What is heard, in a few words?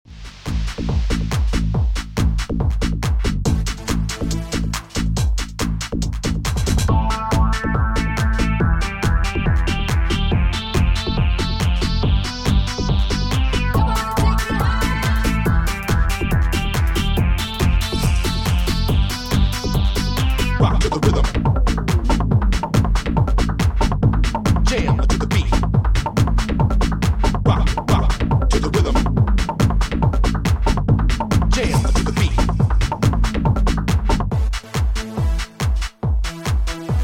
dance